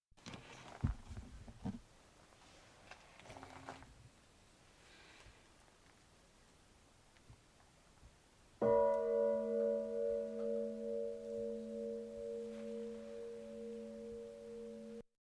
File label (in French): cloche; St Aventin, cloche 1